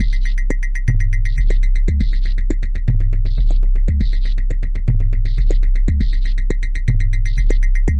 120 dangerously subwoofy micro, drum loops and breaks